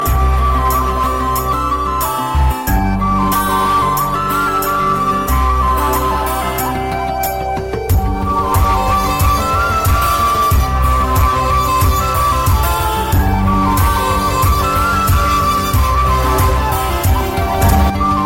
flute

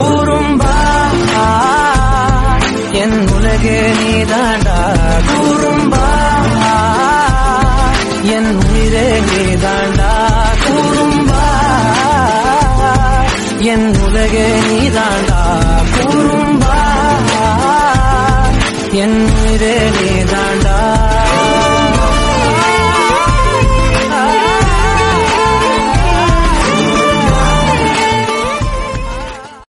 Song Ringtone

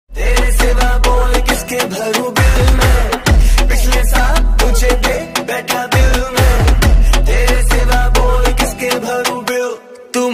Ringtone

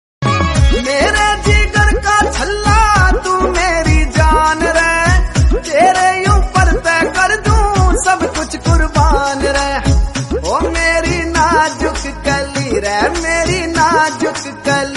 Remix Rajasthani

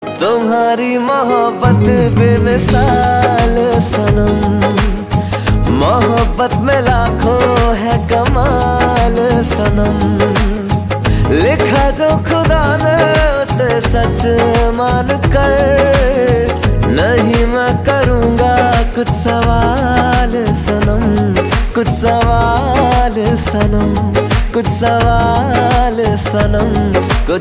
Song Ringtone

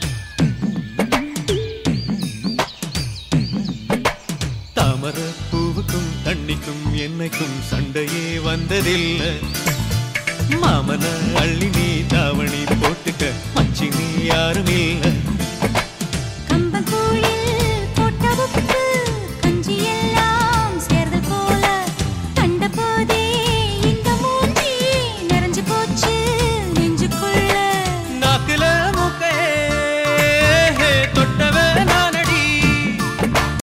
Song Ringtone

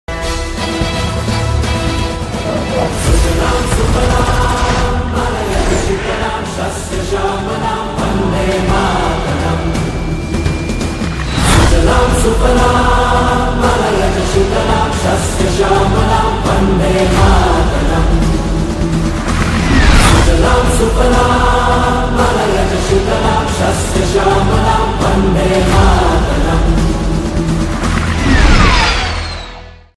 Bgm Ringtone; Fighter Movie

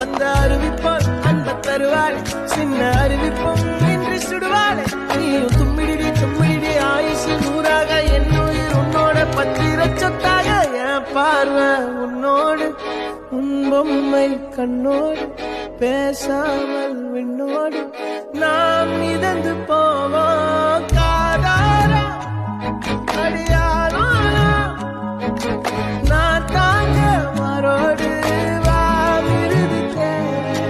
Song Ringtone

